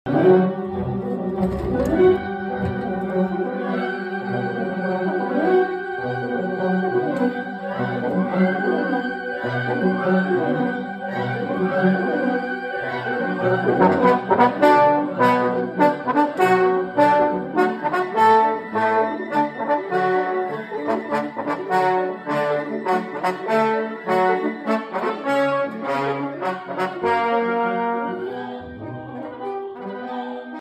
trombone

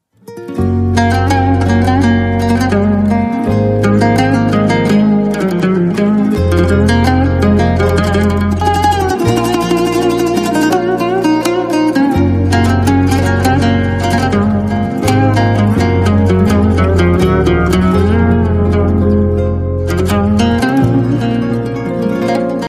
Honeymoon Ringtone

